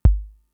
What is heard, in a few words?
kick, Fricke MFB-522; KICKS